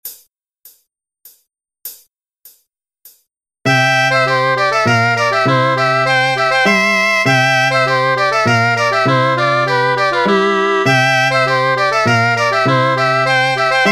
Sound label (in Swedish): Polska; Polska efter August Nyholm